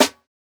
Snare, jungle breaks drum sounds

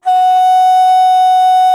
World Winds, Old World Instruments; E-MU Producer Series Vol. 7 – Old World Instruments; World Combos

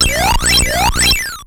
Pac kill, ATARI

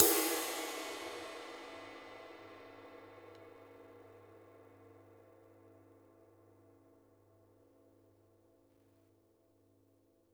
flat ride; JazzFunkKit

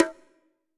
bongo lo; Greytsounds; Scott Peers Sound Engineering

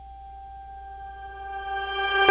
FARFISA ORGAN; Sonic Reality; Vintage Timetraveler

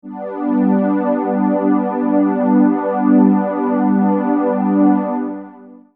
Digi Pads; Jungle; Jungle Frenzy 1

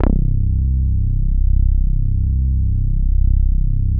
MOOG TAURUS C1; Big Fish Audio - First Call Vintage Keyboards

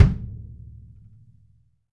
Bass Drum mf, EMU PX-7 drum machine

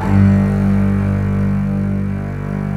CURT CELL; AKAI S-Series CD-ROM Sound Library VOL-7; CELLO+VIOLIN

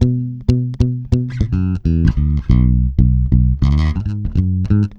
FUNKY WEST B, Bass, 96 BPM; Ultra Freakin' Bass; Sampleheads

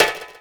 One Shot Perc, Drum N Bass - Percussion; Sonic Foundry (Sony Creative Software); Knutrix Drum & Bass

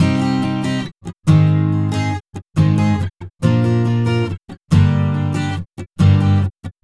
Acoustic Pop Guitar 140BPM Cmin; Acoustic Pop Guitars; Vengeance - Essential Clubsounds Vol.4; Guitarloops

